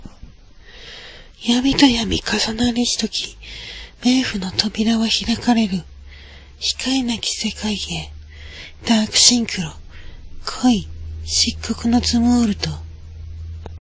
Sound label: dark synchro